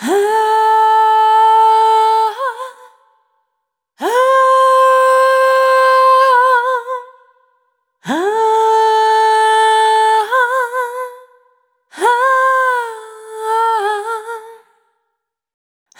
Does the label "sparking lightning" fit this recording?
No